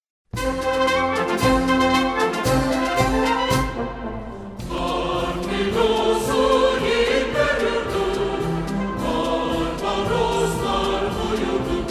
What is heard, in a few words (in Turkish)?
harp filosu, bando marslari